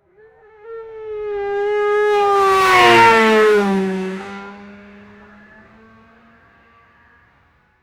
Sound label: formula one car passing b